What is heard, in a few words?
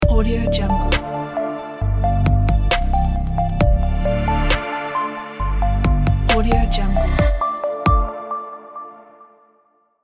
techno glitch logo music